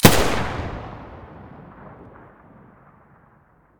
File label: muzzle ump45 stereo, weapons